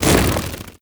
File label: buzz, antlion wings; creatures